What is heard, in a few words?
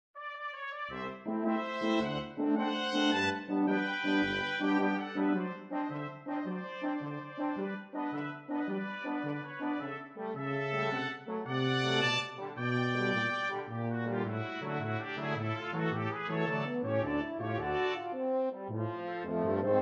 Air Force Brass Theme